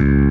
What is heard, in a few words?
bass